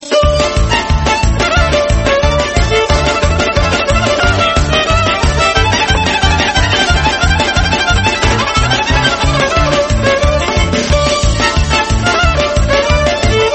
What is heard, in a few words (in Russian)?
Chardash narodnaya